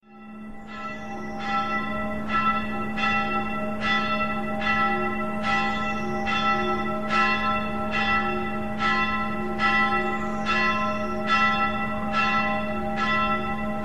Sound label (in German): glocke, kirche